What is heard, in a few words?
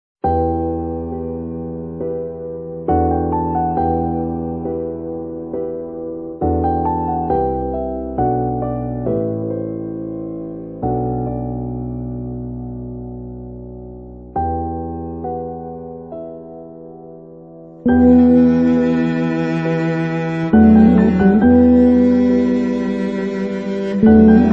bikalam